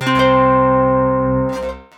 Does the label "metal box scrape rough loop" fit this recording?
No